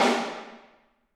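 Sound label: snare drum, Drums; Bob Clearmountain Drums I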